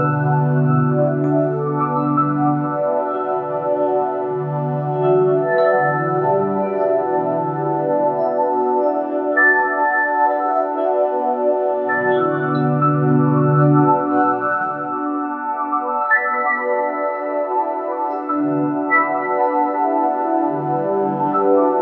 a gentle looping ambient, background music

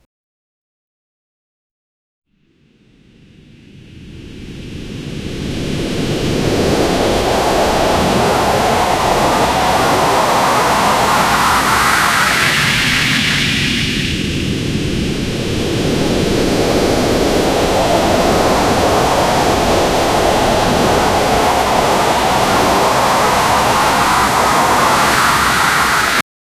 a fantasy mana charging sound, beefy

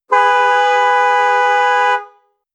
cars horn; apology

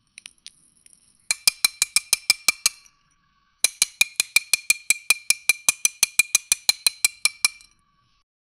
the sound of the knife, knife

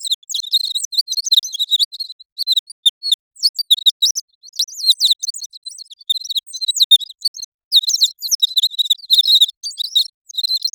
weird synthetic chicken

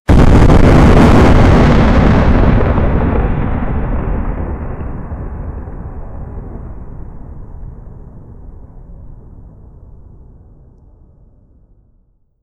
lo-fi muffled large battl; battlefield

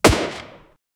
antique musket gunshot wi

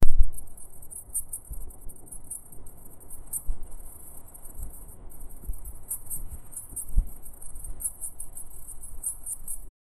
a golden retriever puppy, nighttime

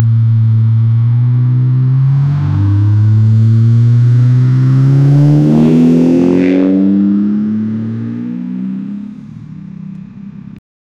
revving engine of a sports car speeding away

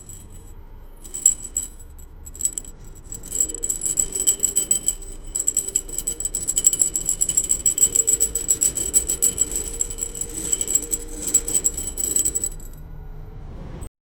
the sound of metal object

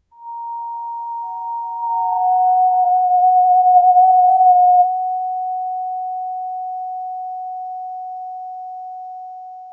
haptic vibration sound

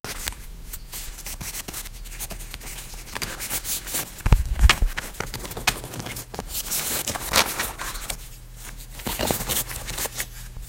ASMR sound of books

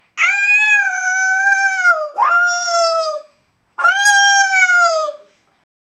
fox's echo 300 Hz; loki

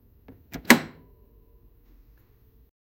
objects

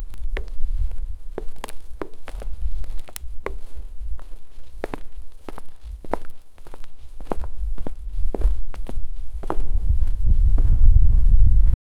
quick light footsteps running on pavement

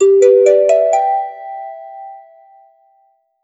notification sound for a, beep

